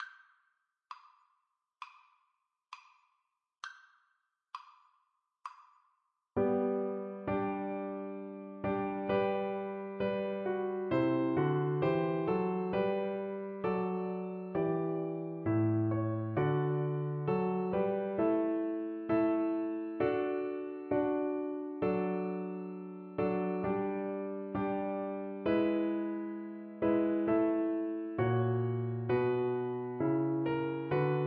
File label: Navy Hymn, Flute